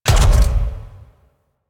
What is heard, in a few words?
Mech Step Run; Nechro